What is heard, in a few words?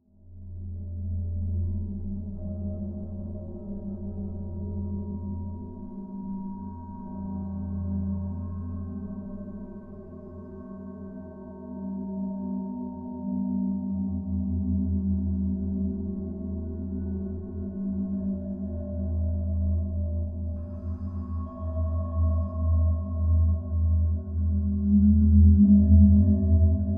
Creepy Backgrounds